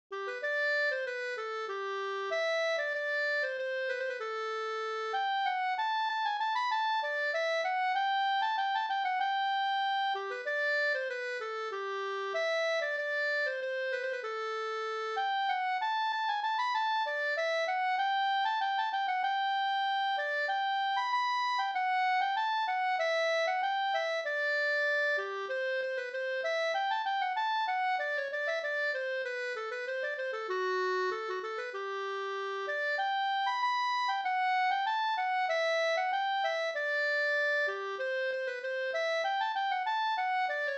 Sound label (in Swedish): skanklat; Tabergs brannvinslat; Rattvik